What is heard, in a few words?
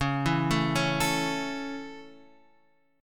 C sharp Minor Sharp 5th down Guitar Half Step Down; C#m#5